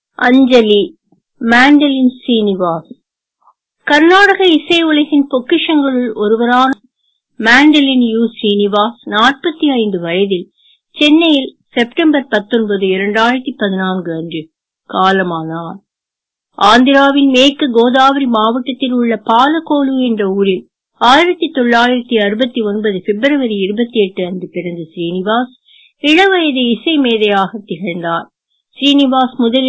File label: Mandolin